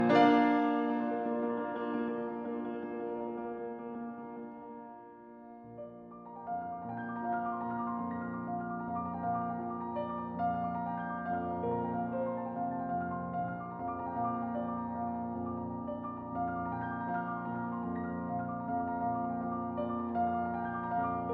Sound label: Apotheosis piano; Tchaikovsky- The Complete Solo Piano Works